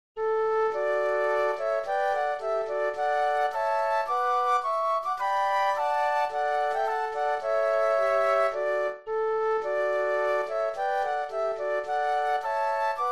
The Minstrel Boy Flute Trio; patriotic; patriotic flute trios

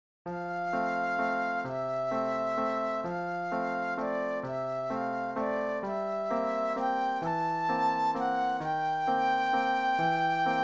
Waltz Flute Solo; classical; Tchaikovsky; Sleeping Beauty

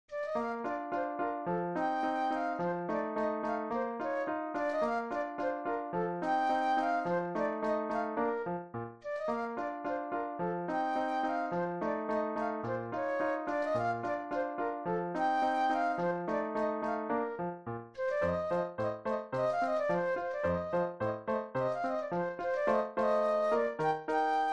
Ecossaise Flute Solo; classical; Beethoven; WoO 23

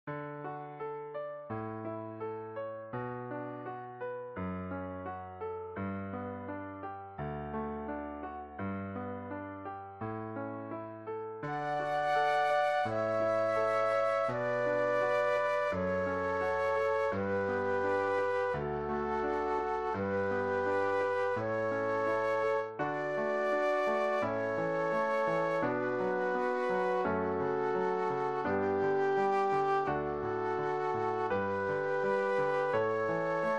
Canon Flute Duet and Piano; classical; Pachelbel

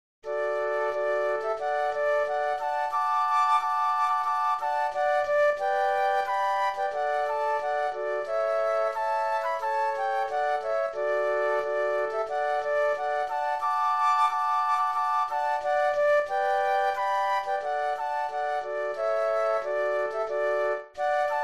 Scotland the Brave Flute Trio; patriotic; patriotic flute trios

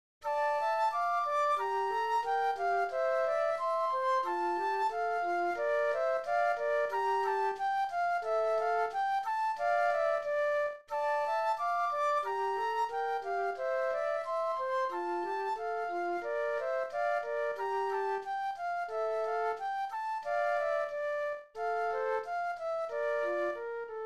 Air Flute Duet; classical; Handel; HWV 604

